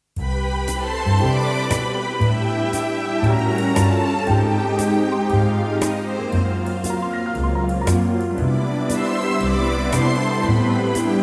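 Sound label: C live trak, Backing Tracks for Male Vocals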